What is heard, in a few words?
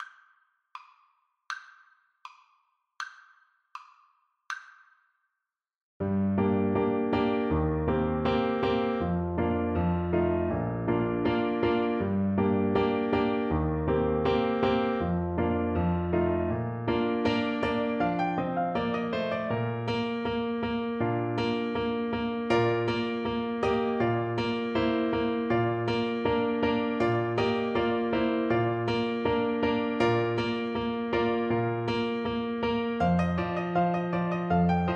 janitscharen, tuba